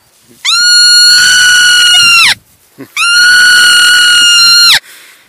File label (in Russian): surok, zvuk surka